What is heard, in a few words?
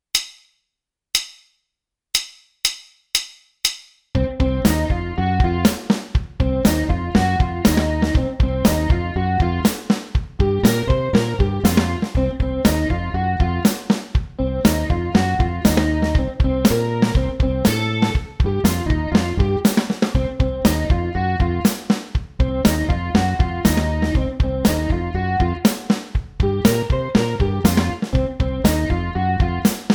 Riff n Rhythm Track